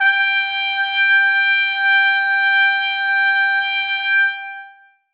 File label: trumpet g5; Sonatina Symphonic Orchestra